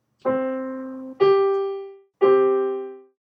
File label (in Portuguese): quinta justa